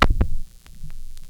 Band Search, Vinyl Record Samples, Needle Noises

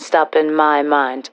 Vocals; Vengeance Samples; Special Sounds

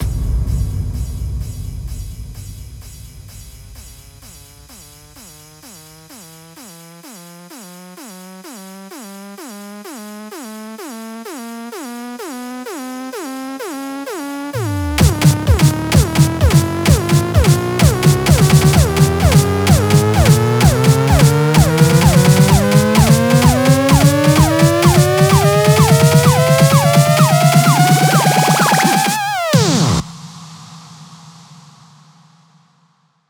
128BPM, House Electro Fills; Beatrocker 2; Vengeance Samples

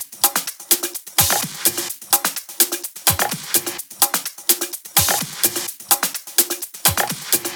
127BPM Kit; Vengeance Samples; VFH2 127BPM The Mission Kit

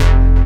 Synth Bass; Vengeance Samples; VEE Synth Shots

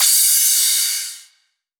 Crash; Cymbals; VES1 Crash

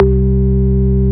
Bass C, Offbeat Bass

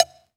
Minimal Perc; Minimal Percussion

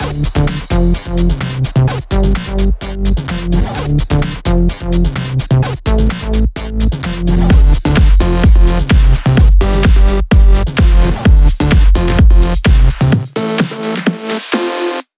128BPM Mix; Vengeance Samples; Morpheus Kit

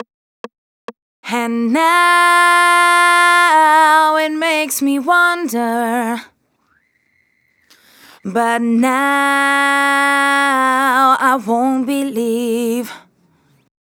Bridge, 136 BPM; Vengeance Samples; Breakaway

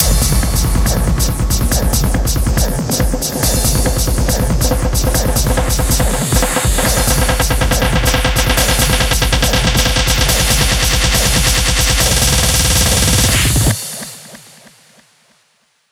140BPM 8Bars, Hands Up Trance Fills; Fragile; Vengeance Samples

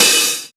Open Hihat, Cymbals; Vengeance Samples

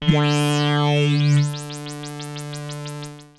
F D3, synth; Roland S100; musicradar-synth-samples; Roland F Multi

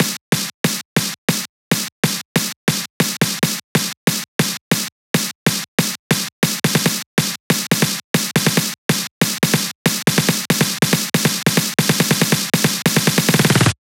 140BPM SNARE 8 Bars; Killer; Vengeance Samples; Snares&FX Pairs

